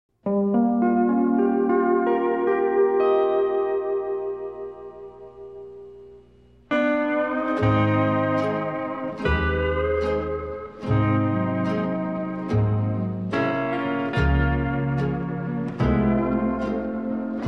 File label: Instrumental